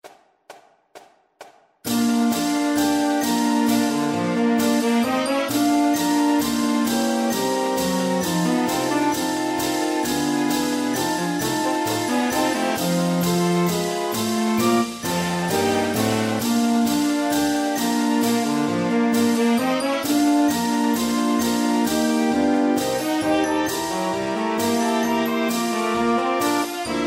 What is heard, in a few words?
Stand Up - Cheer - 4 Taps, Marching Band